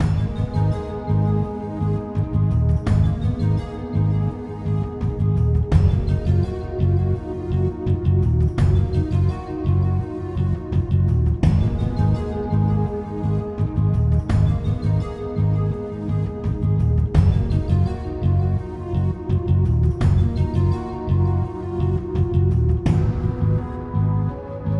stage music; primal light linux macos switch windows xbox one xbox series xs gamerip 2020

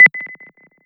Time Tick

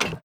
button

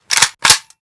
Reload

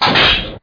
Metal Impact

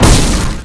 Car Crash

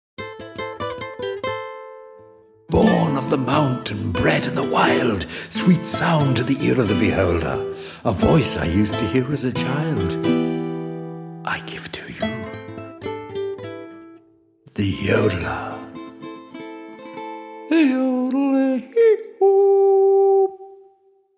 Yodeller; Guild of Dungeoneering

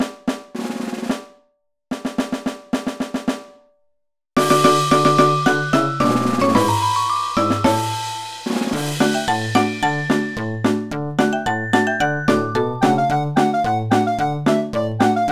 BGM Ending; Kirei Zukin Seikatsu 2; NDS

